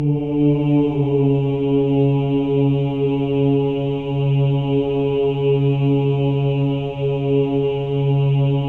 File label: Voices; Spectrasonics; Symphony of Voices; HALF DOWN